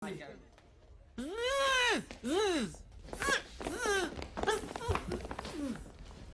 bzzz, ambience

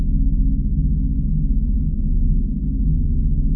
abstract air loop, ambience; cstrike

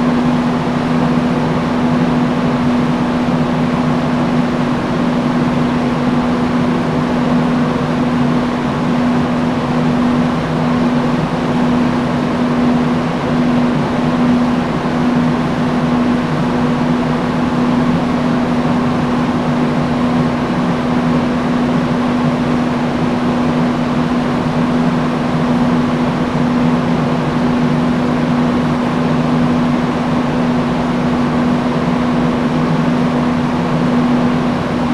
industrial fan; cstrike; fly bhop pepsi

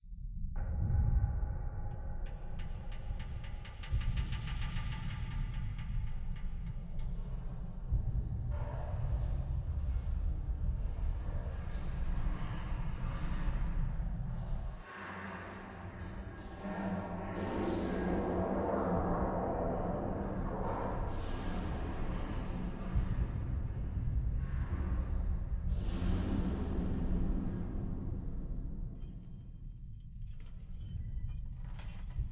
weird ambience; cstrike

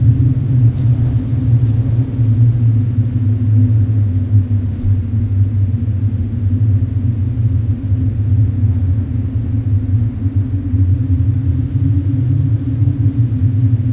tunnel wind loop; ambience; cstrike

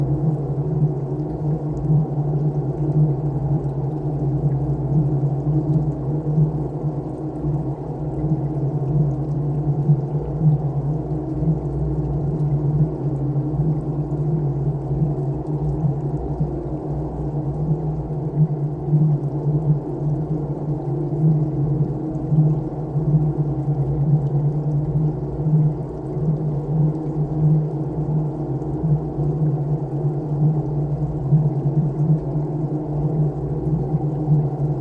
underground hall loop; ambience; cstrike

